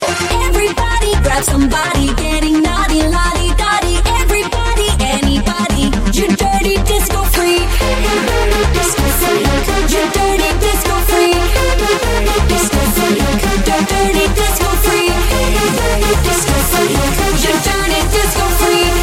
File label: disco disco ringtone